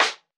hiphop snare, Effect on

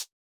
hihat; Electro; futurefunk

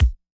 chillhouse kick; Electro

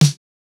snare, snare house

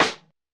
Hiphop snare; Dry original

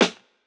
Jazz hop snare; Hiphop

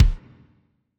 hall resonance kick

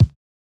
Hiphop kick; Reverb+EQ+Comp+Limit

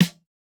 no effect j city pop 1980 snare

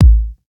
Modern kick, Electro

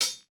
hihat, house

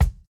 no effect j city pop 1980 kick

